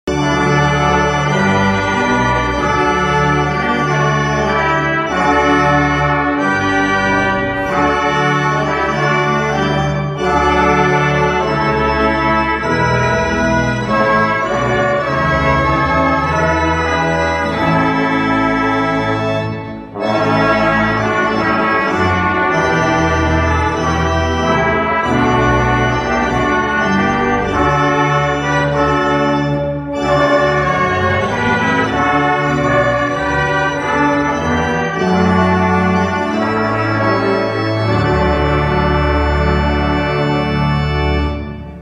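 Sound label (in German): Oh du fröhliche Orgel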